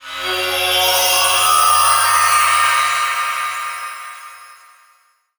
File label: shining hidden treasure, anime